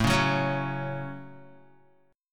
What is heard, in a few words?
A 6th A Guitar, chord; strummed; JGuitar; 4,4,6,6,6,x